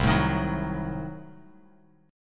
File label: C Diminished 7th down Bass Standard; strummed; JGuitar